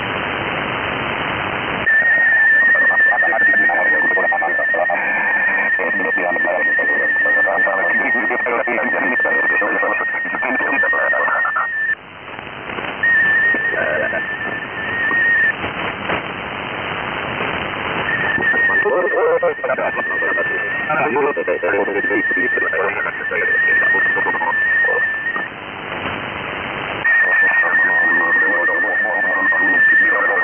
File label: 4691khz usb maskirator analog sinhro fsk; ahti